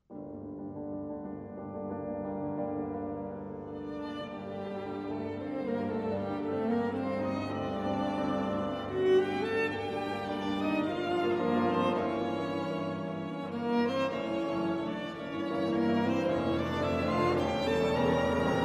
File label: piano quintet; zarębski